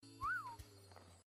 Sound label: Whistle